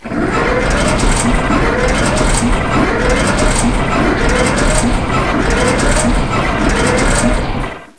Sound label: win open; ambience; caged